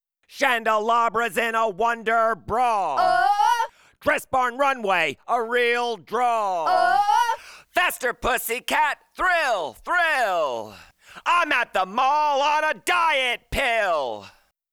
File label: Vocals